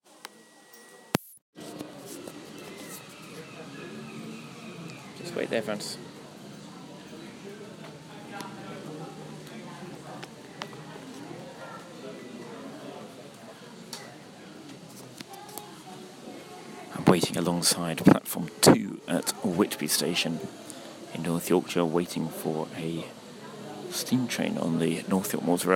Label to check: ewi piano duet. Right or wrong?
Wrong